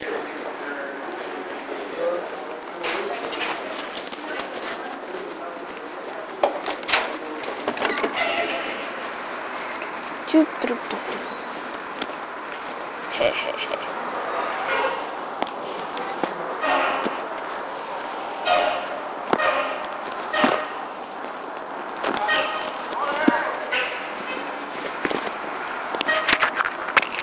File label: doodedoo